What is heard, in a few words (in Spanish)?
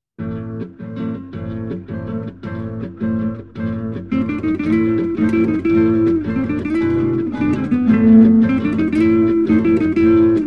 flauta guitarra y arpa de los andes